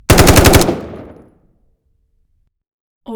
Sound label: sig 552 burst shot medium dry; soundmorph